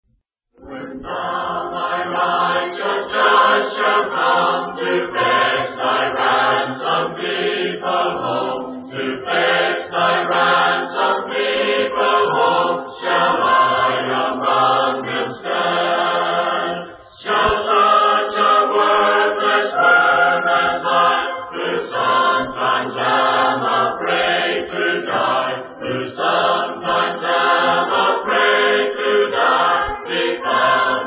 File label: a capella hymn, when thou my righteous judge shall come